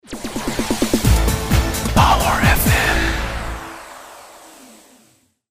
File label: jingle short